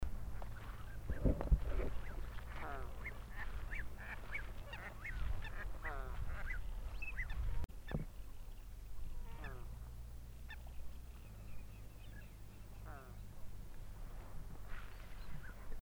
flamenco andino, Andean Flamingo, Phoenicoparrus andinus; cantos; Phoenicopteridae